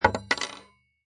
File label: Silverware Drop; Kitchen Sound Effects